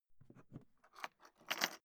briefcase plastic open metallic rattle; industrie wirtschaft; bueroarbeit

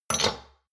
shot glasses stack medium; berufe; gastronomie; soundpool

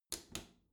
bathroom cabinet close soft slow; objekte; tueren

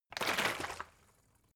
bottle water big upend; berufe

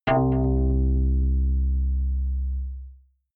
synth hit drop echo; whooshes rises

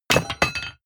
drop metal dirt; hits explosionen; metall

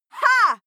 female shout hah; schreie; menschen

